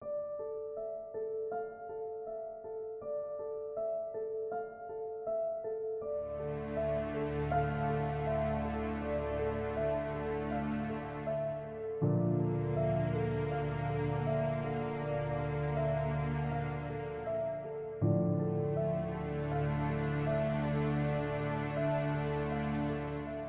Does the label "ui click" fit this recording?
No